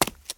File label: cement step; Original TK Sounds